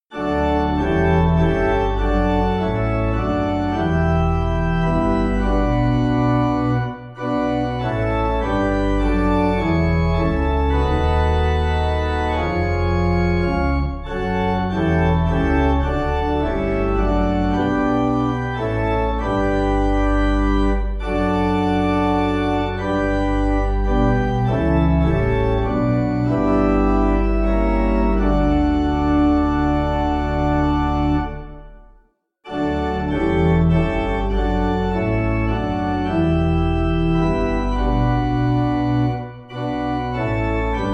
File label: Lutheran Pipe, Pipe Organ